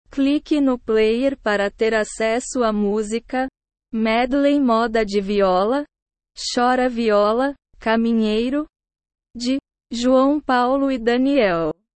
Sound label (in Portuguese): medley moda de viola